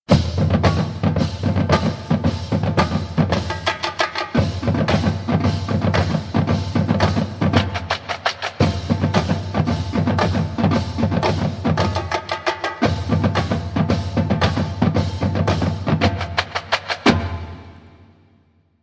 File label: Drumline